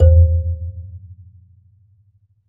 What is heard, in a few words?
AFRBASMP, BASSE AFR; Univers Sons - Raricussions